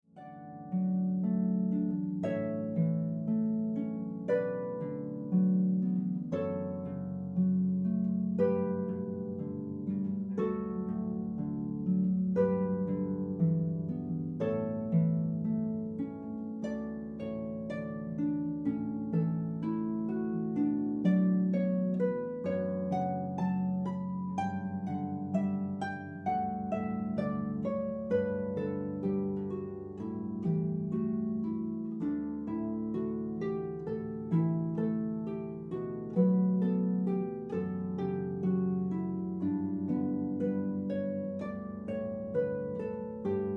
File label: Canon in D, harp